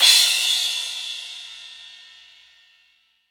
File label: long room reverb crash cymbal audio clip c key